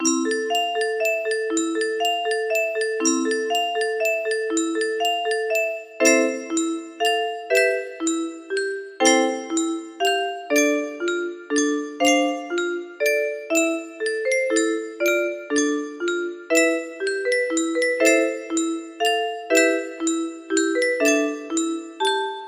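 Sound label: 15 note, music box melody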